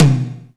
STEREO DRUMS

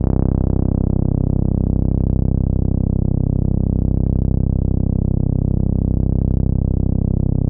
MGTAURUS C1

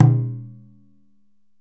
DJUN DJUN, African Perc; E-MU Producer Series Vol. 7 – Old World Instruments

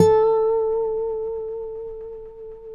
Finger A 4 L, Guitars; Spectrasonics; Hans Zimmer Guitars VOL-1

